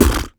Human Beatbox, Beatbox Hits; Loopmasters; Vocal Hits And Kits; Human Beatbox 2

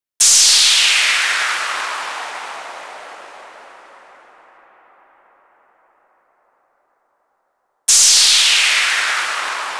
125 G#m Sfx, Complex Electro Synths; Prime Loops; Transport

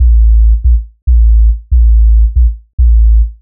140 A BASSLINE, Dubstep; Loopmasters; The New Sound Of Dubstep; BASS LOOPS

